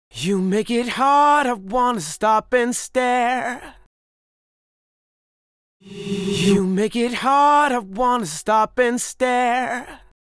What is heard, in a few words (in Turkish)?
Ters Reverb Efekti